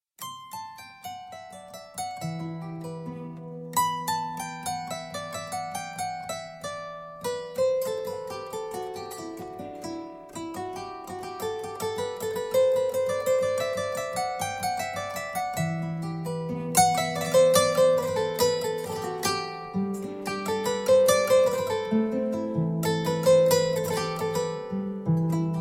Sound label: Instrumental